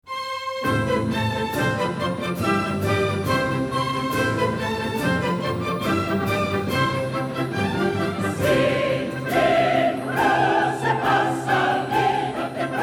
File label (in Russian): Хор янычар; 100 великих хоров; МОЦАРТ; Похищение из сераля